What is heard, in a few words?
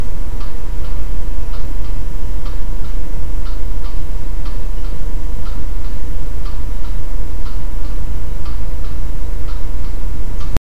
drive sounding like a Lanz